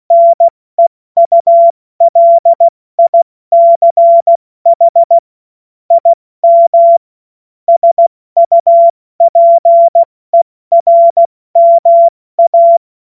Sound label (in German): Witz, Morsekurs, 70 F80; Witze